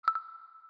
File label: light blink